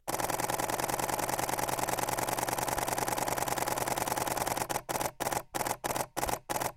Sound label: Canon EOS 7D Mark II Burst